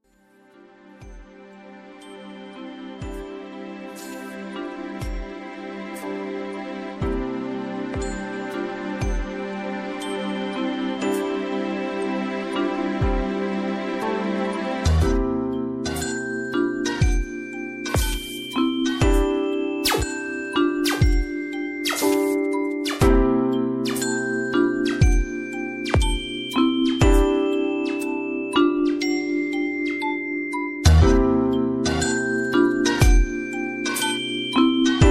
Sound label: splashes small; SPOTS